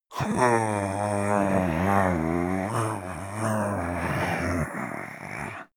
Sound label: male growl sleep; menschen; koerpergeraeusche; soundpool